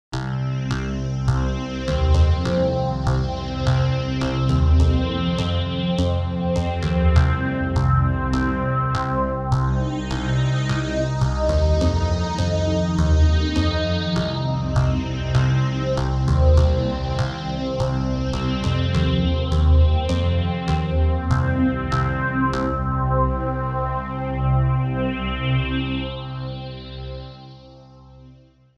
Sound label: Dual Analog